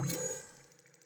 teleport ray start; ui; dota; vr

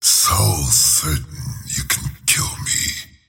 attack pain; slyrak; dota

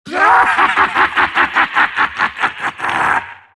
silt laugh; dota; siltbreaker

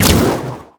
arrow launch; weapons; dota; mirana

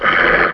wolf preattack; weapons; dota; lycan

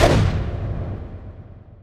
desolate; spectre

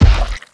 step, footsteps; pudge

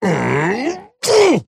attack effort large; dota; axe

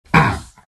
pain, vo; arc warden

